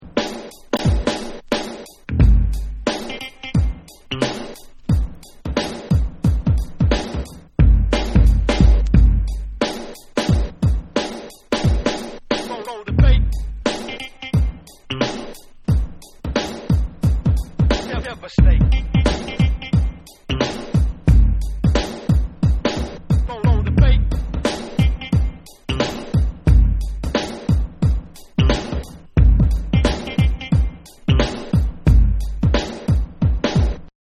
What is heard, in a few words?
Instrumental